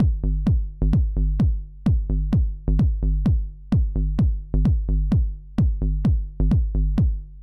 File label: kick bassline sidechain